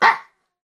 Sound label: maltese dog; animals